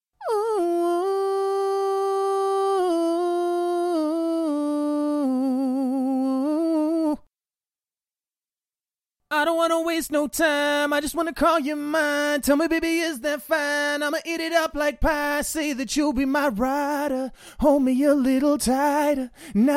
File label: pop rnb 116 Dm